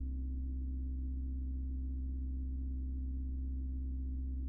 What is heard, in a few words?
Diesel; Diesel2000